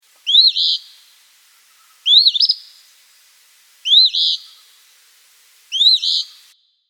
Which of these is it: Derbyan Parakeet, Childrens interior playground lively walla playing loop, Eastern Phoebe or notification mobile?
Eastern Phoebe